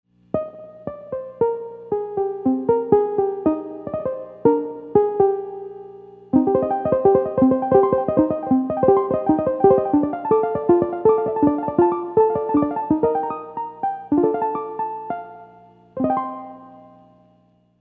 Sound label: pro piano piano solo, Farfisa Professional organ